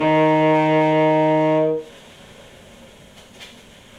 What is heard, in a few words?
sax; blocks neural instrument coding